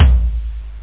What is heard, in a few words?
real tom; toms